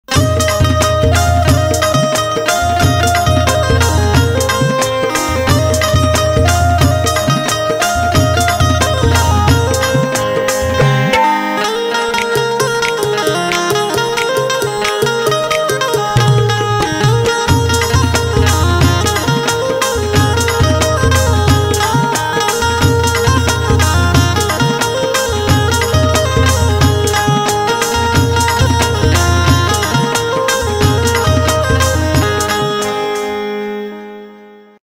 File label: banjo instrumental